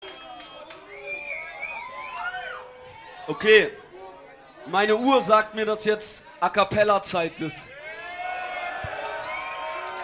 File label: Acapella